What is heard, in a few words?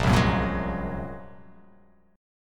A sharp 7th Suspended 2nd Sharp 5th